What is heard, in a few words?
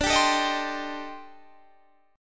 A-Minor 7th Flat 5th D, chord; Am7b5/D strummed; JGuitar